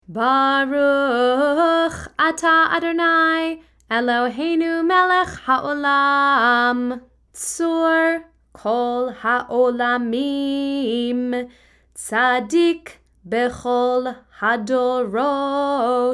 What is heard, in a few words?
Haftarah Blessing normal speed Closing